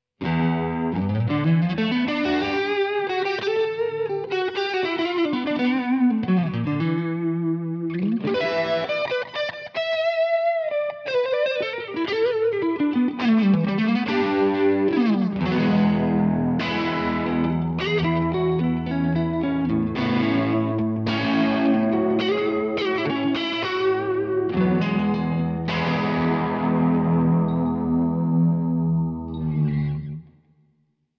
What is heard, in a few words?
Eventide Tricerachorus Chorus Crunch